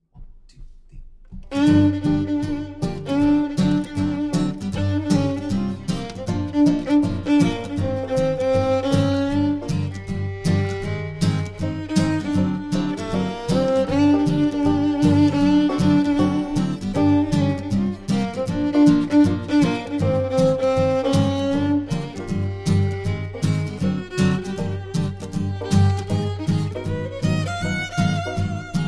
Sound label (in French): Douce Ambiance